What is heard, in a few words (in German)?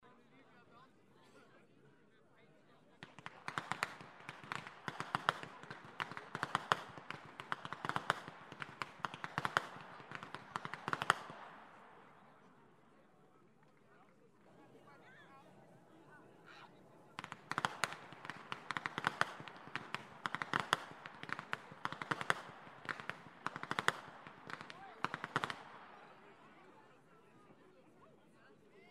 schnalzen, flachgau; BERGHEIM IV